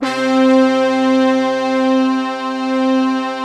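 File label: brass tutti, Slow Brass Tutti; Optical Media International - Sonic Images Library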